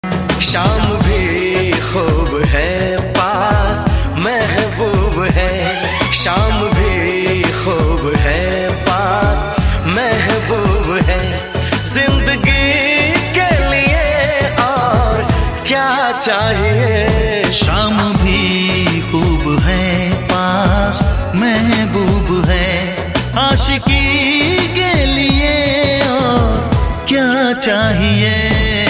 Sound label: hindi song ringtone